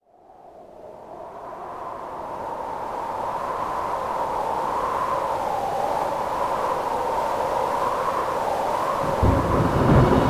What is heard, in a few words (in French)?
intro orage